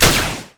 ranged attack; LoL; jayce

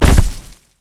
pounce land; nidalee base

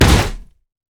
basicattack; pantheon

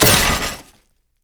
land; jinx base

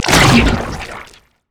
bounce splat; LoL; zac base

